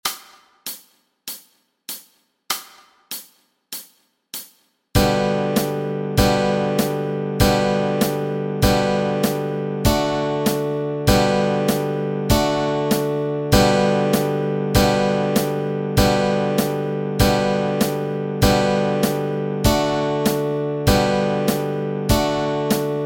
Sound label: chords, Easy Guitar and Ukulele